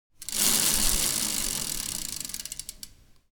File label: fast bike wheel spin